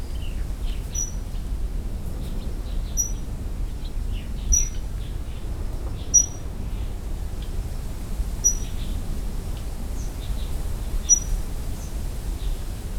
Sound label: warbler pale legged leaf Phylloscopus tenellipes